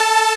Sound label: SYN SYNTH, dance synth; Sound & Vision - Gigapack I CD 2